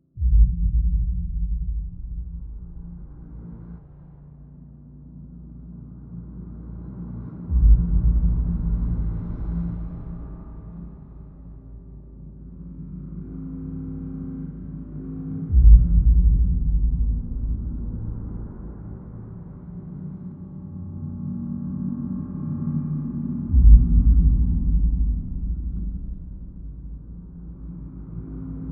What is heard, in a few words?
Cinematic boom continuous